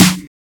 Small Reverb Snare Single Hit F# Key, snare one shot tuned to the F# note